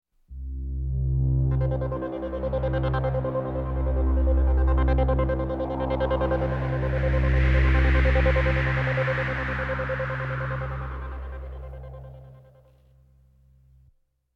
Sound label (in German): zentrifugalkraft, Science Fiction Geraeusche